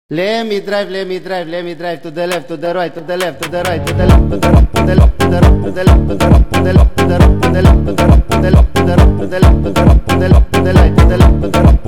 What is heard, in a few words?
Funk